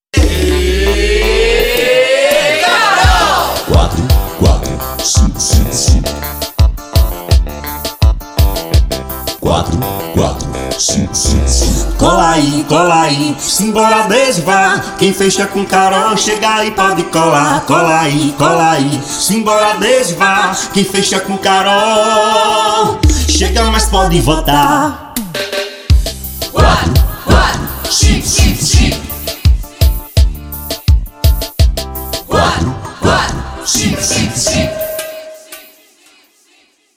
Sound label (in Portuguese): Vinheta